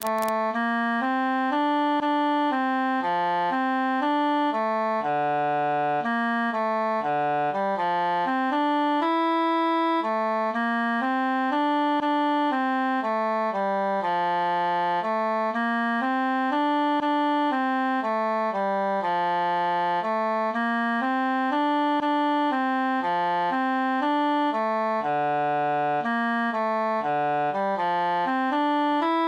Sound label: tenor solo; tres morillas